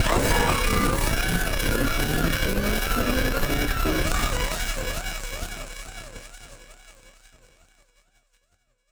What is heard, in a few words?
Battered Synth; malfunctioning synth samples